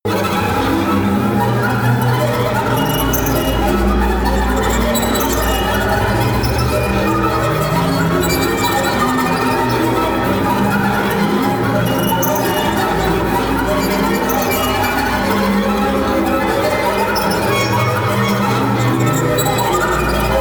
atmosphere satans orchestra low

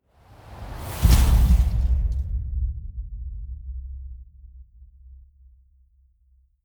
gust hit

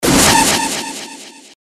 initial sweep

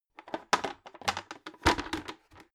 bucket drop soft

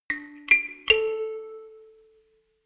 notification kalimba rattle triad up major

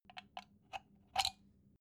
socket pull plug soft long

